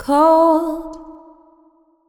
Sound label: Cold, Female Vocals, Wet; Advent Calendar 2017 Day 1